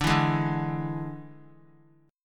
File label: D Suspended 2nd Flat 5th D; strummed